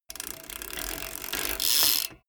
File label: bmx bike pedal once freewheel brake